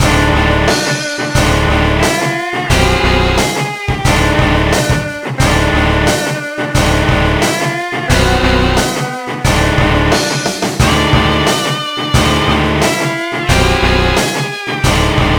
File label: Failure; Balls of Fury; ds gamerip